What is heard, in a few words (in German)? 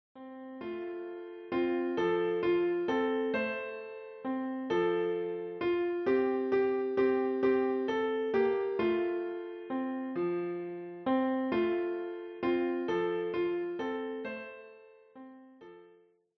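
Ich geh mit meiner Laterne, Klavier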